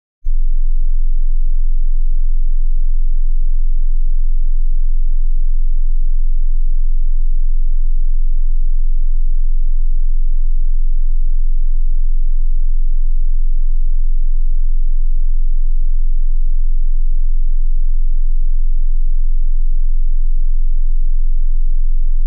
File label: 21.5hz Sine Wave 0db L; Denon Audio Technical CD